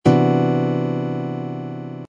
c major seventh sharp eleventh chord voicing, guitar